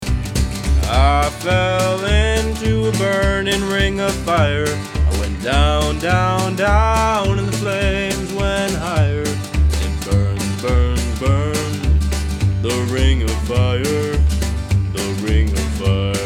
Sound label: Vocal Folk; Vocal Reel